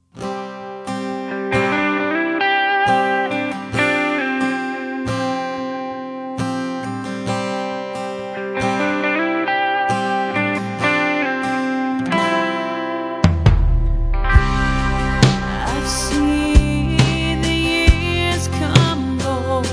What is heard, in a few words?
Heartbeat